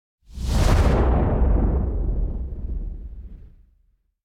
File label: Impact Whoosh High Metal Heavy Abstract Sword Strike - High Abstract; Hollywood Edge; sound designer's toolkit v2